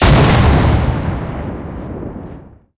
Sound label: cannon 76mm qf17 shot, tanks; War Thunder